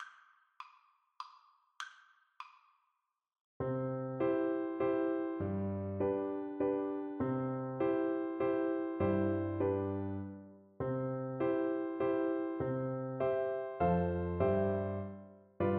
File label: afton water FL kar, Flute